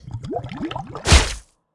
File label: Big Melee Attack; Pyon